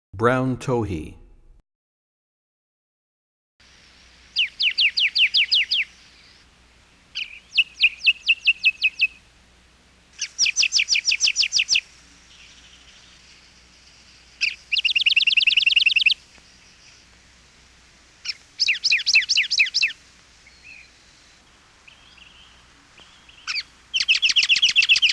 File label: Brown Towhee, bird songs; 101BirdSongs